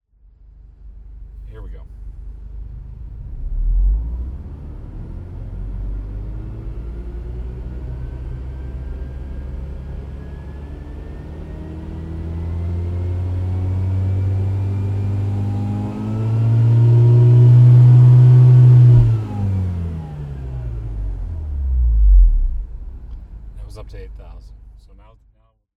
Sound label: Motorsport Run Up